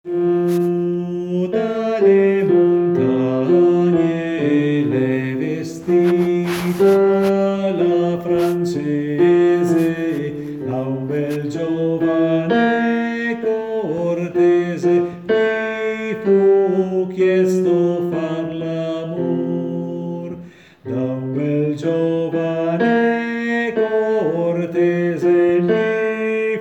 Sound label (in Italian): batironi; la vien giu